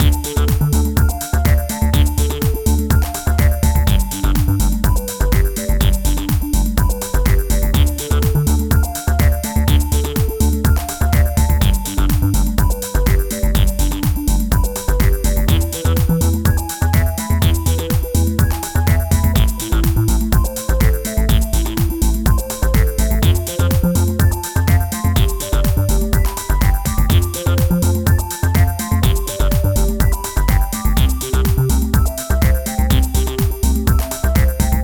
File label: Quirky Action, action